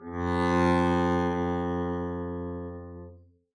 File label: arco f2 f down, double bass